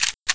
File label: tec9 bolt; czero opforce